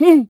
effort; The Wild Thornberrys Movie; BOKO

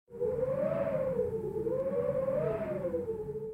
Power Tornado; Secrets of Magic: The Book of Spells

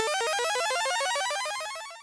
victory; Summer Catchers

